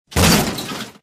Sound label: metal break